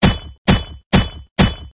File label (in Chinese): 挖土